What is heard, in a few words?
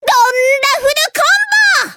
donder full combo; taiko